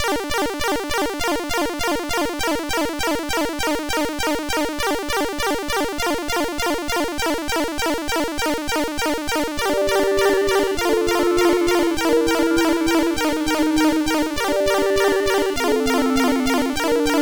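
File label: MOS CSG8580R5, sid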